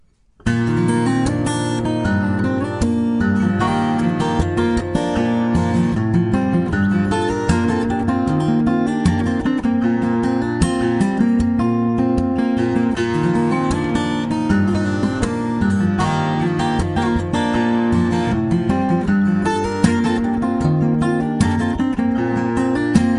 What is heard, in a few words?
Acoustico